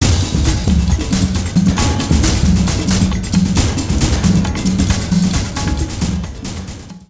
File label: Liberate Settlement; just cause gamerip